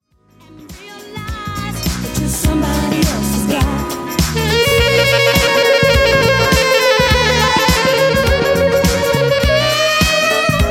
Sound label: Sax